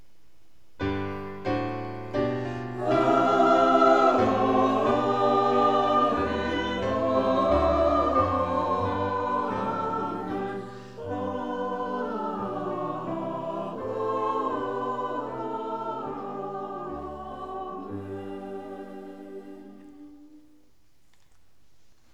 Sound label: amen choir and piano; Heavenfield mass